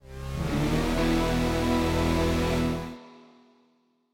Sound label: Completed; Rocksmith